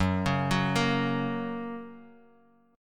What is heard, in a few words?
F sharp Major, F sharp, chord; arpeggiated; JGuitar